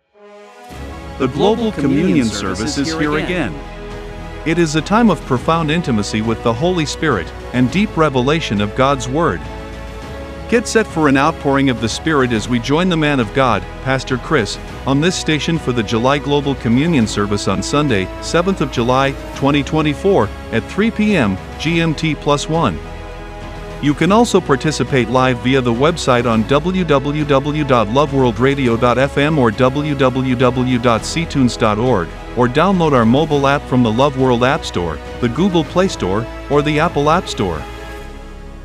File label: JINGLE